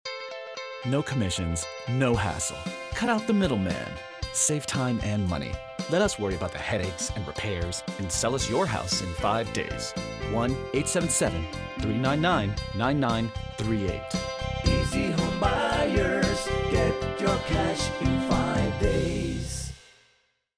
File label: radio jingle; ezhomebuyers